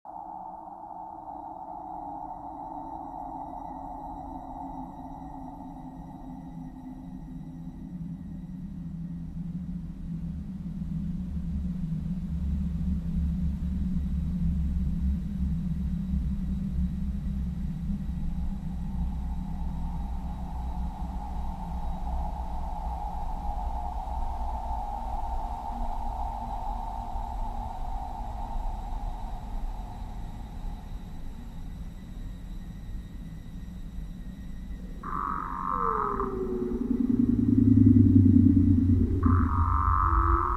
A Loud Hissing Screech which Drops in Pitch Halfway